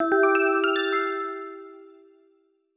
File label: Jingle Check; Sumikko Gurashi Sumikko Park e Youkoso